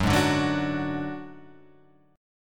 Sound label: F sharp Suspended 2nd Sharp 5th